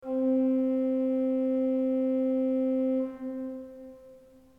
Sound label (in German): ton, orgel